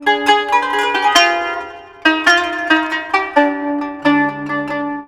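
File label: KANU, Oriental; Best Service; Ethnic Journey Sound Cube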